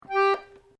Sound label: PIANO ACCORDIAN G4 SHORT, Old Piano accordian